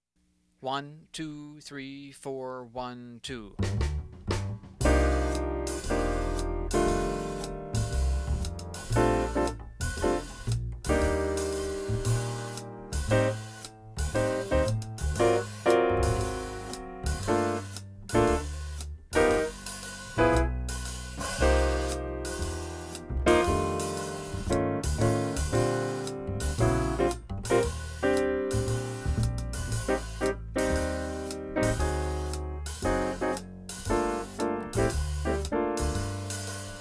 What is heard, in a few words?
Backing Track